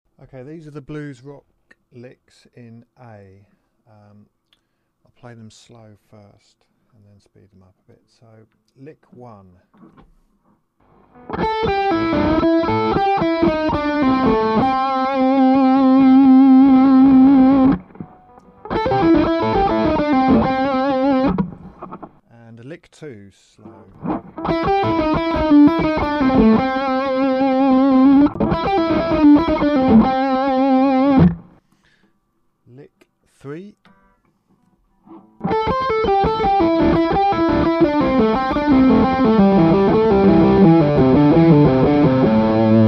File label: blues licks